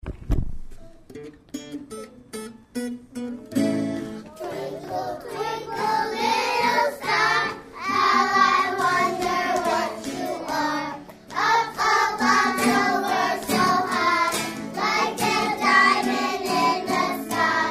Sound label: Twinkle